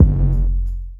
Kick Drum, Drum One Shots

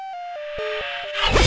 CYBER-TRANCR; AKAI S6000 CD-ROM - Volume 1; CYB POLIS

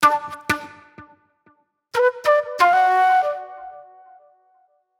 Sharp Delay Flute

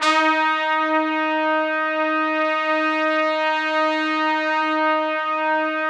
D#3, Orchestral Brass; Best Service ProSamples vol.20; AKAI

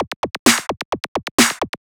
130 BPM, Drum Loops; Zero-G; Club Techno; Drum Set

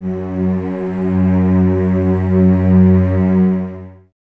cello F#2, String Ensembles, Cellos, Expressivo; Miroslav Vitous

